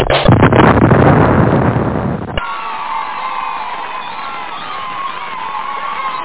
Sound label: lick; starbase